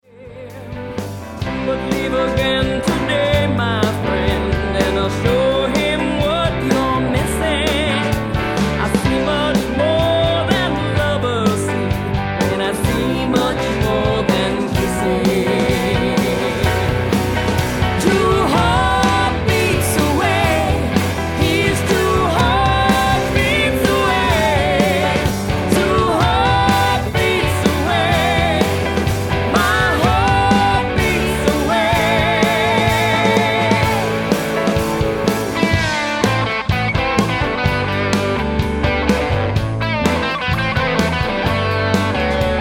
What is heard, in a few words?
heartbeats